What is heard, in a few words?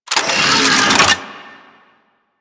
Flak Cannon Charge; Grineer